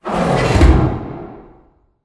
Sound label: pod door sm verb close slide; Titanfall 2